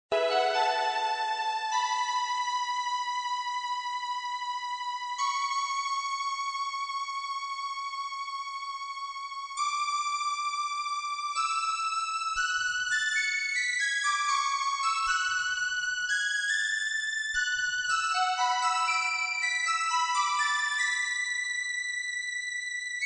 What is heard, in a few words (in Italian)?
musica piante, voce piante